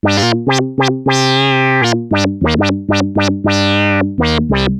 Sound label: ARP Odyssey, Arp A Line, synth samples; musicradar-synth-samples